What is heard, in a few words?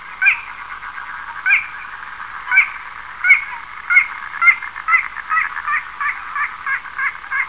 Porzana parva